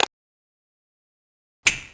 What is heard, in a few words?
begin